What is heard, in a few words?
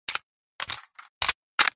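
MP5 reload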